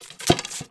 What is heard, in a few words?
arrow return to quiver, Medieval Weapons Sound Effects